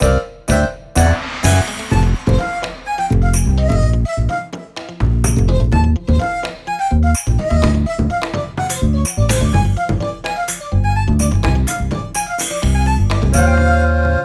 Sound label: BGM Draw China; Clubhouse Games: 51 Worldwide Classics